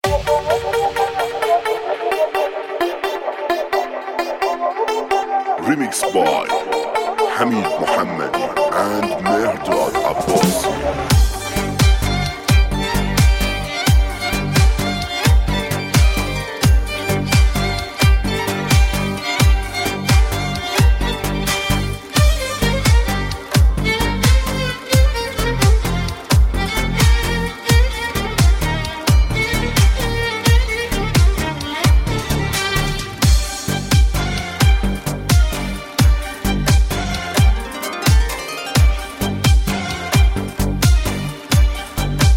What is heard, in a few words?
Remix tribal